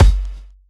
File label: Kik; Rawcutz - Nu Forms